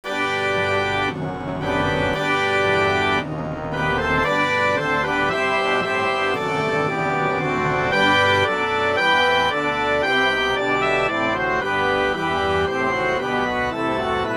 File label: March Triumphal, Organ and Brass